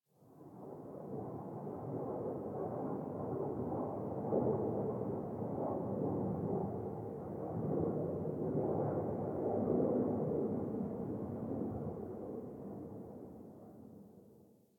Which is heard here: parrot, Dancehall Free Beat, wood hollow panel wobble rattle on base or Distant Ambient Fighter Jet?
Distant Ambient Fighter Jet